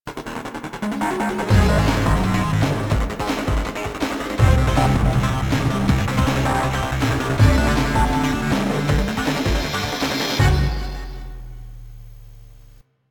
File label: Music Outro; Warframe; minigames; Caliber Chicks minigame